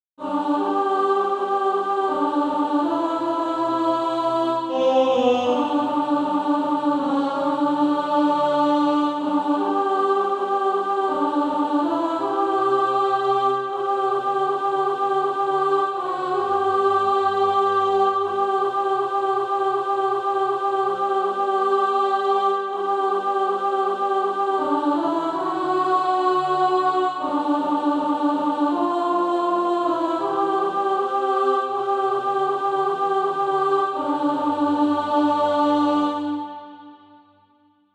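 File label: alto, SATB Practise Music; Christ Is My All in All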